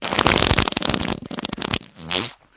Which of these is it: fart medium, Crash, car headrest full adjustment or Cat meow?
fart medium